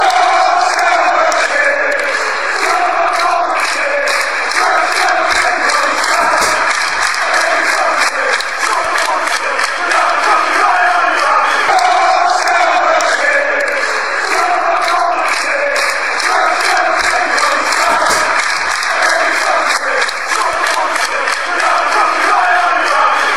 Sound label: fanchants; dont sell mcshane